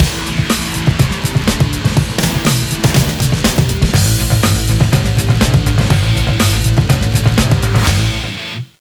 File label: Music Football